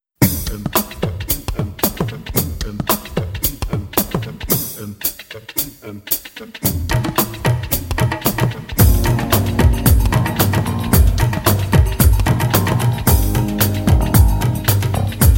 Tabla Dholak; bombay boys 1998

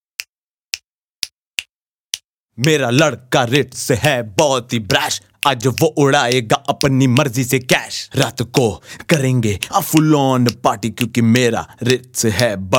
Birthday Song

